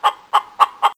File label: cluck; Animal Sounds; Various Animals; Short calls